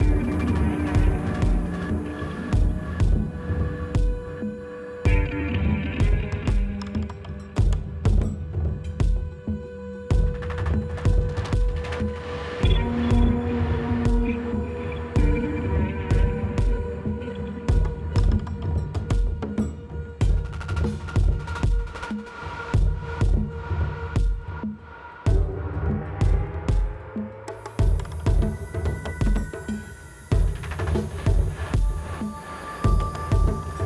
Music Boss Boss Fight Phase 2 Boss Fight Intensity Medium; Sifu; Boss Hideout5; Phase2 normal